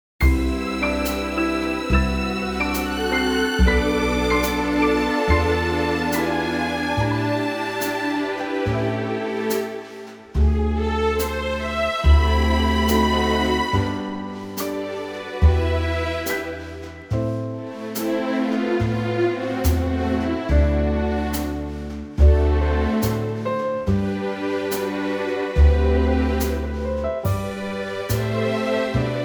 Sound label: orchestral; G